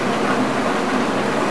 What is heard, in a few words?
roll; RapidQ; 3D